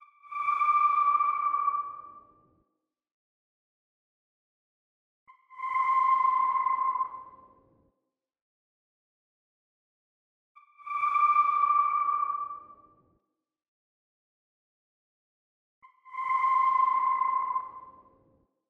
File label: 91 BPM G Min Ambience; Loop Stems; Cymatics; Gravity